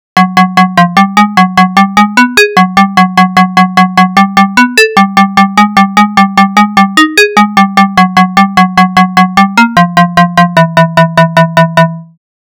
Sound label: FM, Sound sonification